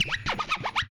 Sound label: xmas scratch; nova